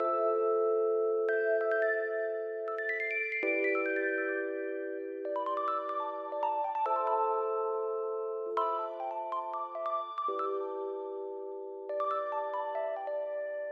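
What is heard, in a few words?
Electric Piano Tempered Reverb; eventide temperance pro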